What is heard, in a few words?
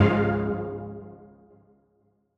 Old Skool C#2; KG MegaSaur; M8